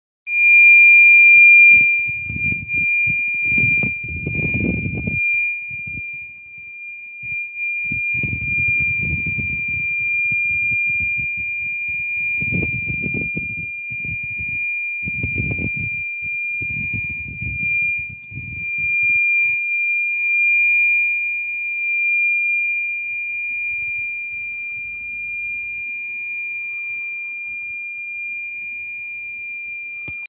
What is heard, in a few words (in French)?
sonnerie confinement; les sonneries d'alarme au college